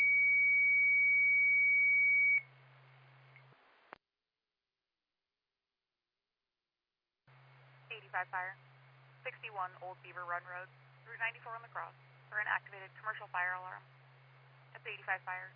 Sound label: Siren; Lafayette FD